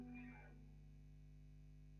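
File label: GTR Royer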